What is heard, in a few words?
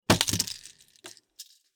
pills